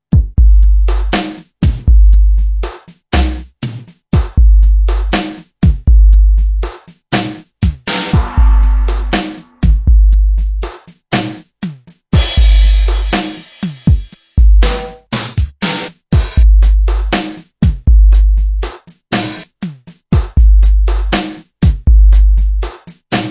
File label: mpc60